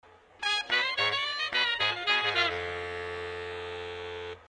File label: Horns